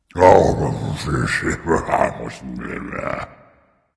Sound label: grumble dragon; fafnir